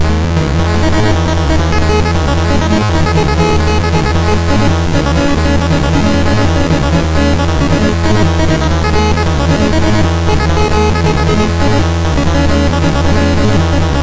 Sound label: Randomly generated music BASIC, sid MOS6581R2